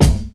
clean steel kick drum sample b key; kick drum samples

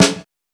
trebly snare sound f sharp key

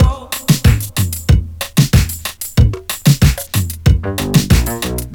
93 bpm drum loop A sharp key; Samplerate 44100 Hz; drum loops

